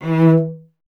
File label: STR VC E 1, Cello; Miroslav Vitous; Solo Instruments VOL-1